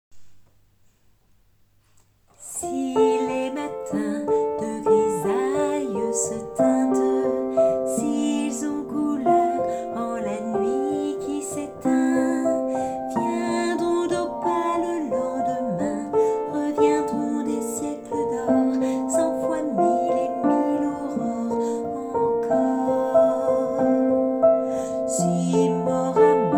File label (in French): alto